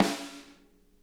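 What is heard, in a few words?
snare edge, drum kits; Sonic Reality; Interactive Drum Kits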